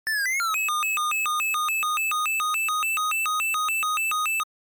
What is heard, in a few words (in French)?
alarme